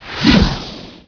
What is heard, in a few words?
poof